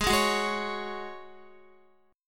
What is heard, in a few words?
G sharp 7th Suspended 4th G sharp, Guitar